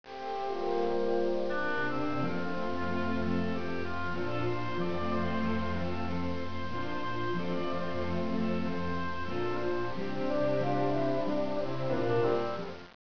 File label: oboe sound